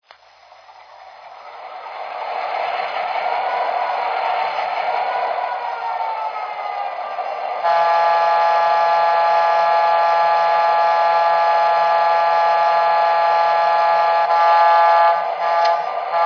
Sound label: E6, trains